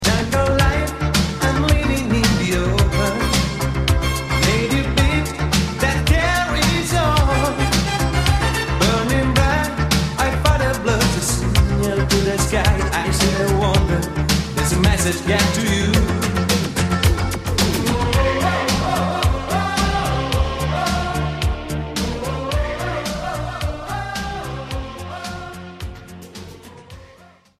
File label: yamaha dx7